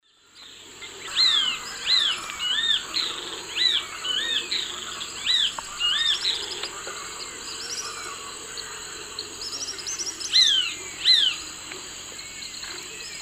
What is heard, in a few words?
Pitangus sulphuratus, dawn song; S. America; Eastern Lowlands